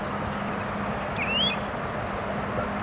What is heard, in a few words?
Pacific Golden Plover single call; Lingshan; nocturnal flight call; Beijing; nocturnal flight calls (NFCs)